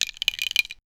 smooth fishing rod sound

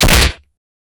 rifle gun shot

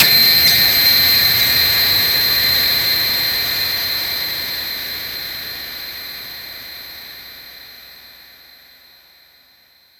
artillery

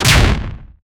sci fi gun fire

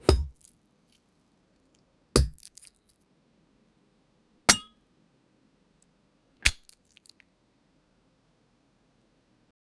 knife scrape soft plate; metallic ping

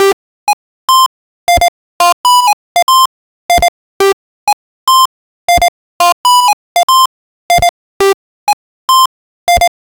super loud alarm, obnoxious

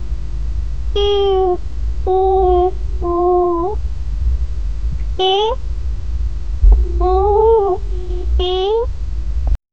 mrrrowl excited cat noise; animal noise

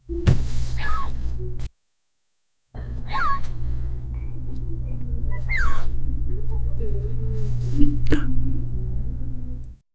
two girls share a long, romance

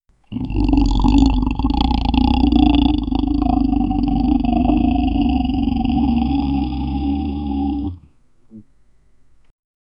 beastly growl inhuman gro

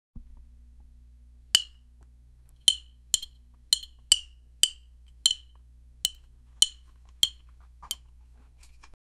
stick sound, blunt

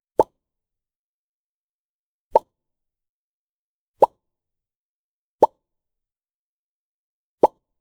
toaster up sound a little; appliances

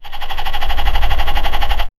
object spinning twice

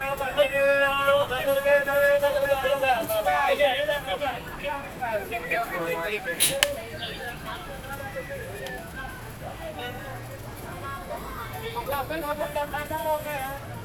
a busy market place in

